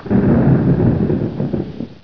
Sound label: stuka rumble